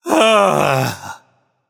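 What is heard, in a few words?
nonverbal ugh; smite; voicepack; merlin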